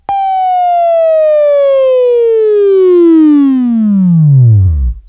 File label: Buzz